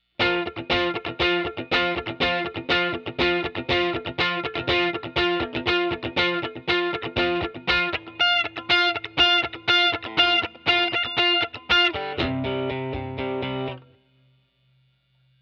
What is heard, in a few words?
Guitar